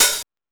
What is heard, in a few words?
Techno D, Drum Machines, EMU XL-7